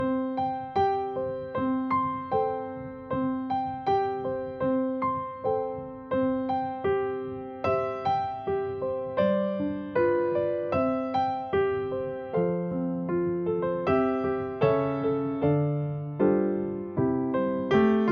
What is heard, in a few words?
bubbles backing